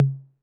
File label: Tom Mid 808 Sat